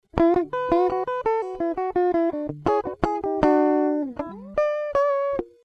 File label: Disto Chorus Room Guitar off